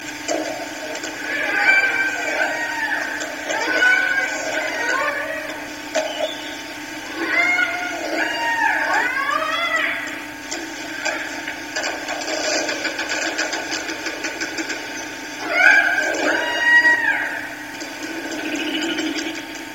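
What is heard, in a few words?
Transients, hydrophone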